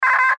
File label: Radio Morse Code; Morse Code Weak Signal Letter U; Morse Code Sound Effects; Blastwave; Warfare